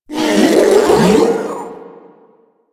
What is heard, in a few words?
Strike Scream; Warframe; Proto Infested Ancient; Abilities